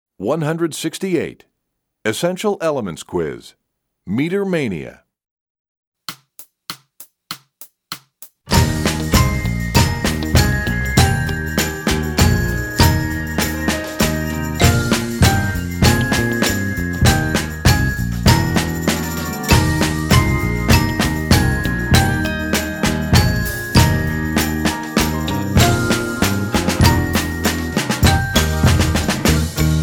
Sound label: Percussion; EE Quiz; EE2000 Book1